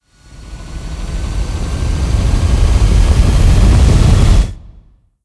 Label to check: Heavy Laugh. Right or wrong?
Wrong